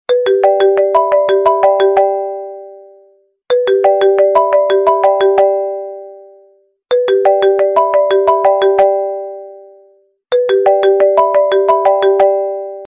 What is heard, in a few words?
ringtone